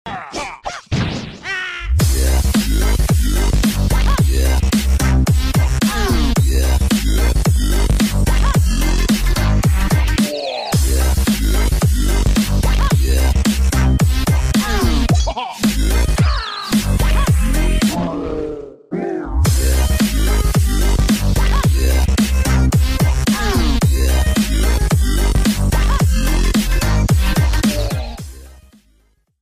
trap remix; clash of clans

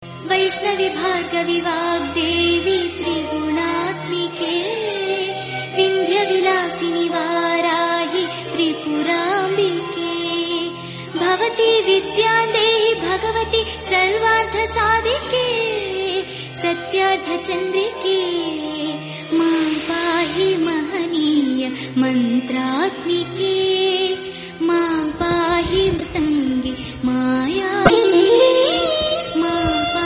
best devotional ring tone

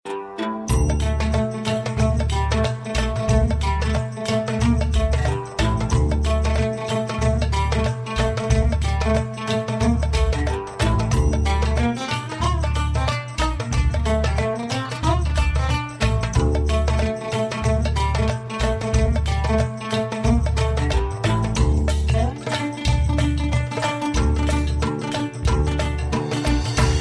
cool egypt tone

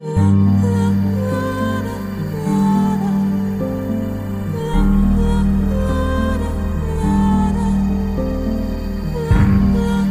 ringtone; american horror story

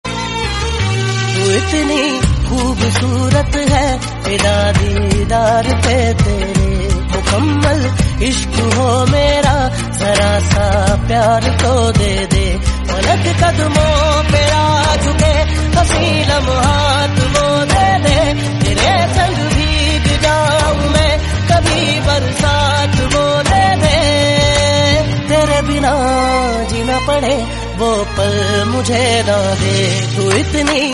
movie song

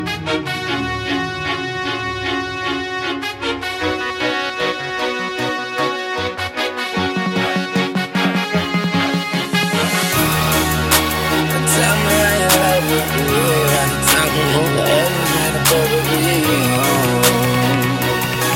ringtone; ballers

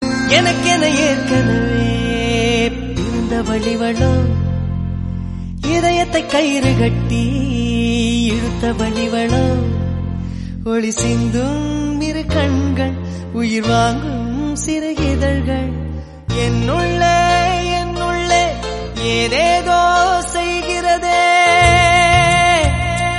old song

